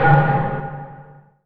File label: ion hit